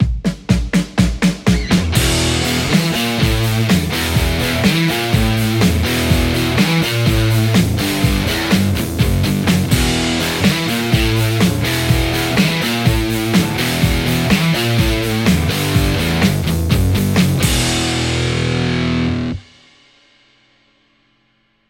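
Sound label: Stratocaster; poulin hybrit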